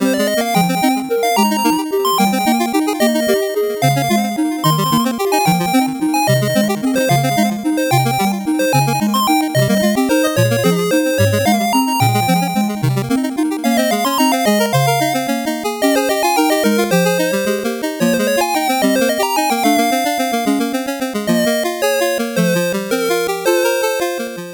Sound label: 8bit bgm fast